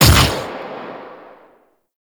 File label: Fire Shot